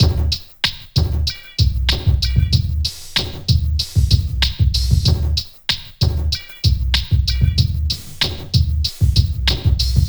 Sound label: Beat 095, Hip Hop